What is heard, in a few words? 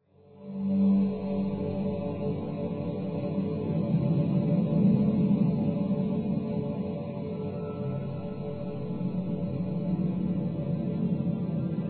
drone